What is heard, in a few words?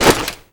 foley gear land